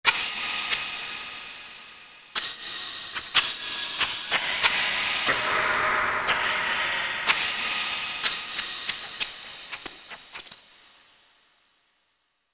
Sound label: Peel Off, Korg MSC-14S Sound Effects